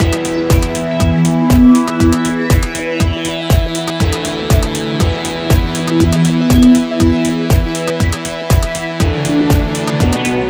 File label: Beat2 Ruhig; yager windows xbox gamerip 2003